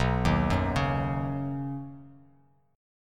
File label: B Major Add 9th, Badd9 chord, Bass Standard; arpeggiated; JGuitar